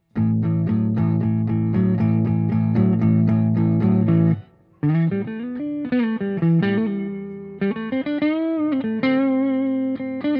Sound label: Clean